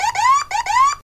Police woop woop

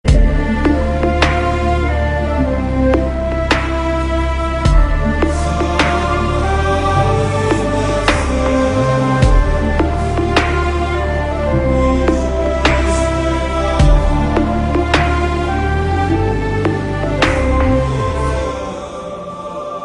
Sad Muzic; Mankatha

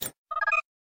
notification

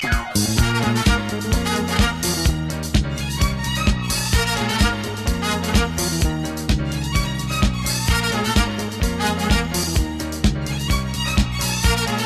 Disco Bass

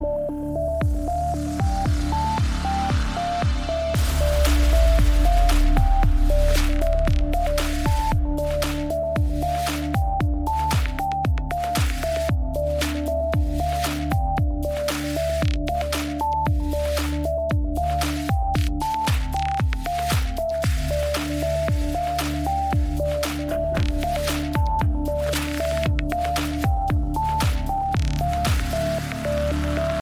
Beats

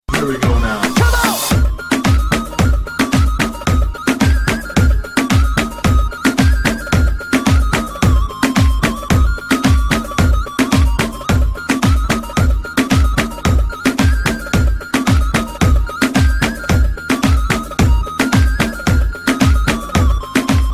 Mix Instrumental

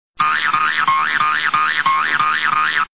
Cartoon Zoings

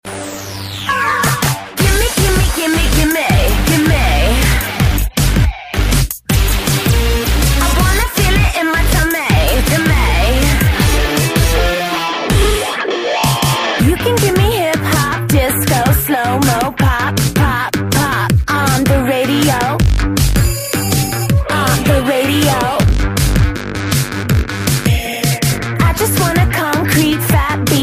Electro

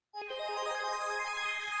Message Tone; Winx

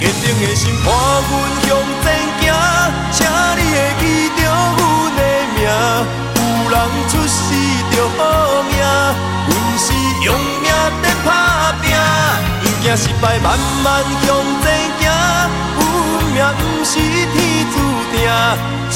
Chinese Song

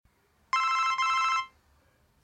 Alerta Motorola V3

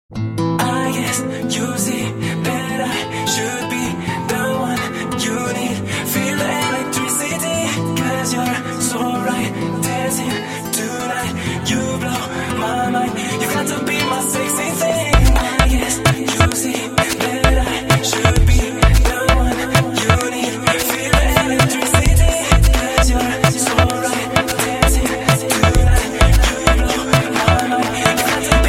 Club Sound

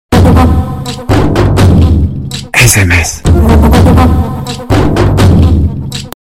Sms Tone; Kumki

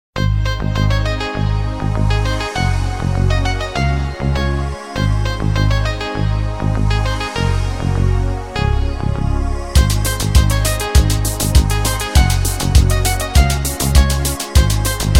koto

